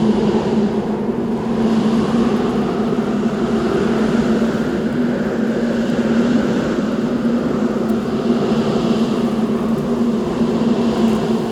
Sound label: Wind outside